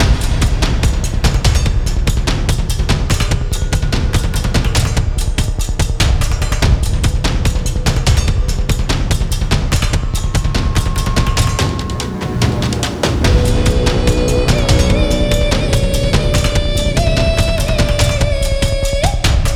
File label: Fight Epic